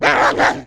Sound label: wolf attack